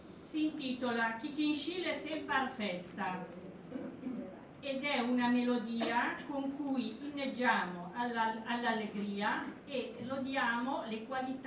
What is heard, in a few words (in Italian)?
coro; IAMIS